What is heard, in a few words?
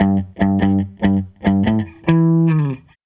Les Guitar Loop